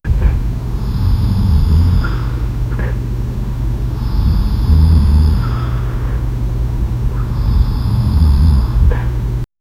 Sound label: a female snoring amidst, early morning